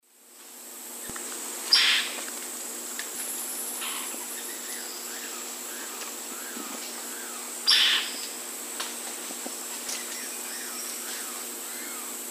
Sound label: Dendrocincla fuliginosa, call; S. America; Eastern Lowlands